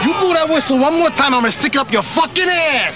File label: sopr whistle